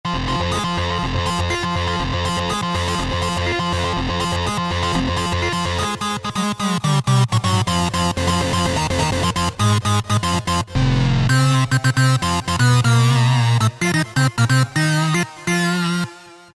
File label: Sync Lead, Roland SR-JV80-06 Dance